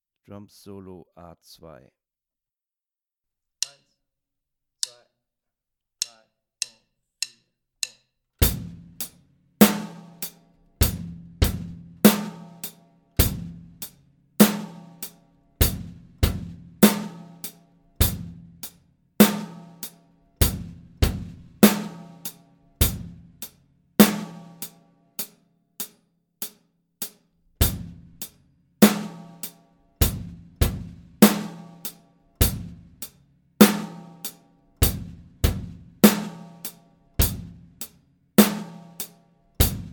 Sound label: drums solo